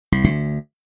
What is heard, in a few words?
bass